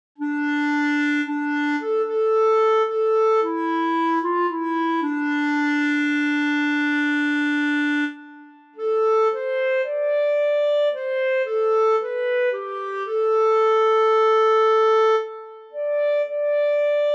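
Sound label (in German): solo Scarborough Fair Bassklarinette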